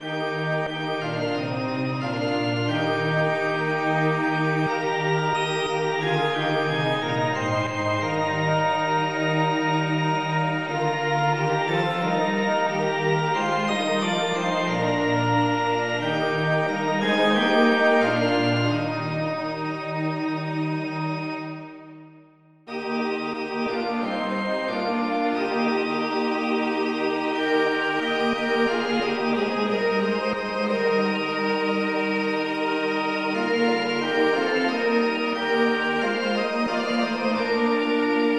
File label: polyinstru; hymns; Himnario grande